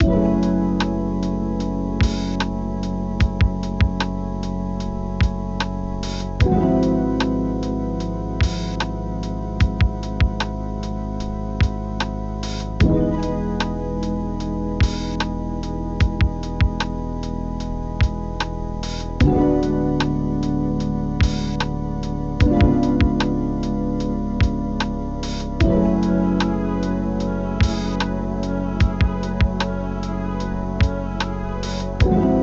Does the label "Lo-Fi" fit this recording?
Yes